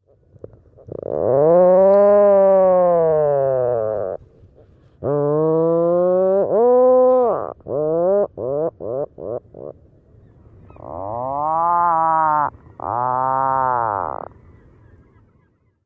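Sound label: chainsaw snores, puffin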